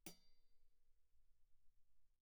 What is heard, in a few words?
hihat, normal, pp; Percussion; cymbals